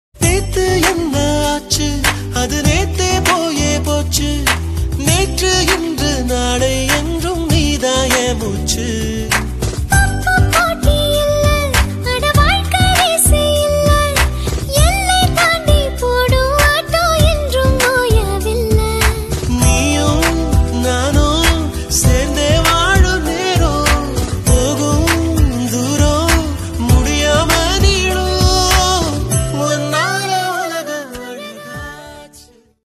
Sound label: Tamil ringtone